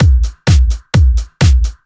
Straight Loop 128 BPM, House

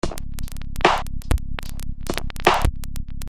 Record Noise; Construction Kit; HIP-HOP; Sonic Foundry (Sony Creative Software)

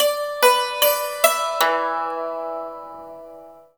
YANG CHING, Heart of Asia; Spectrasonics

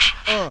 VOCALS; Ueberschall; Jam Box

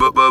TB BUBUB, Talk Box

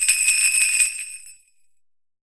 SUZU, JAPAN PERC; AKAI S6000 CD-ROM; WorldSounds

